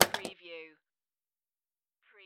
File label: Pinball sound, clank with ball travel